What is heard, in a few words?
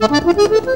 ACC LINE, MELODIC; Best Service; World Colours